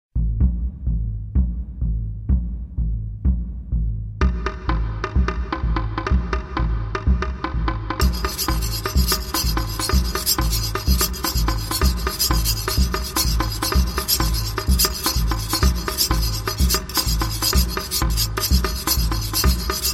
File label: Tamborim